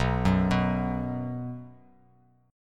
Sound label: B 5th B, chord; arpeggiated; 7,9,9,x; JGuitar